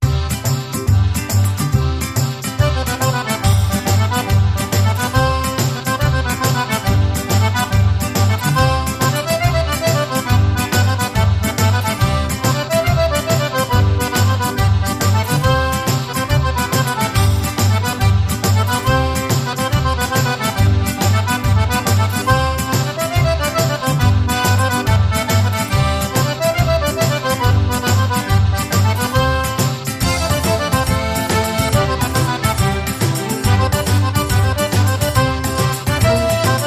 Saltarello Campagnolo

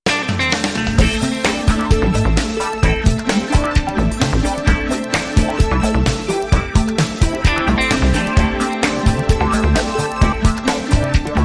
Misteriose Vibrazioni

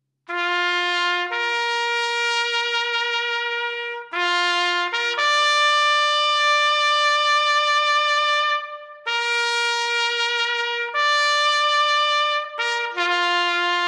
Il Silenzio Fuori Ordinanza (unofficial taps)

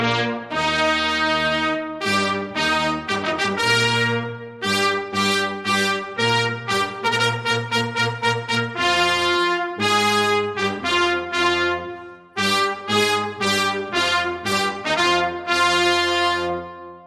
Marcia, Main Theme Brass Only

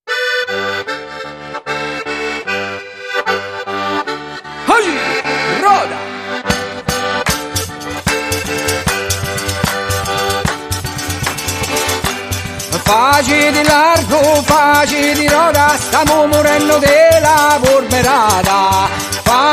Tarantella

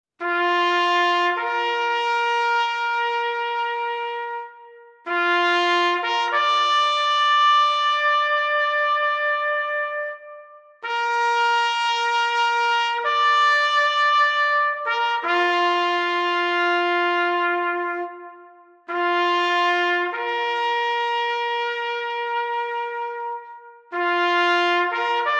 Il Silenzio (taps)

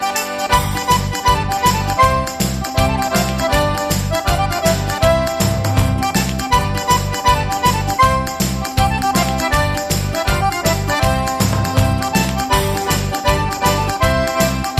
Saltarello

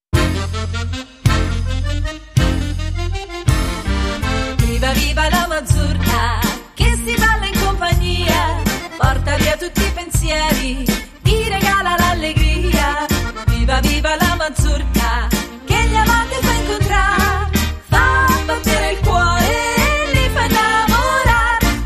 La Mazurka

